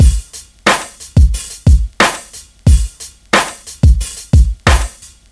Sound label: Dont Stop, hip-hop